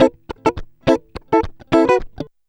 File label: 104 FUNKY, Electric & Acoustic Guitar Loops